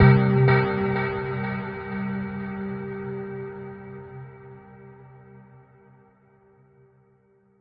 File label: 126 bell echoes b; SYNTH AND ELECTRONIC LOOPS